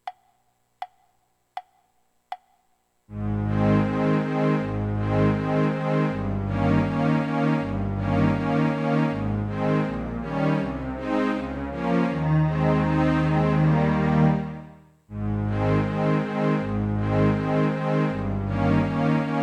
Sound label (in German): hornkonzert orchester; mozart